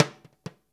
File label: TIMBALE, DANZON; InVision Interactive; Percussion Slam